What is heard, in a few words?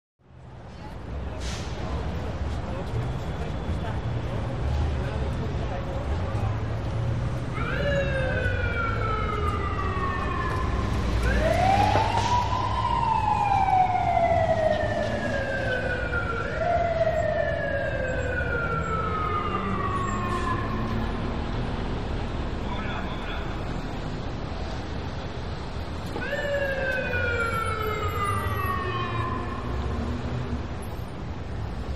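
Siren In Heavy Traffic. Starts Medium Distant In And By Close. Not Steady; Siren Stops And Starts, With Uneven Modulations On Away. Pedestrian Walla, Movement, Traffic With Busses By.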